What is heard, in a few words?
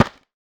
Open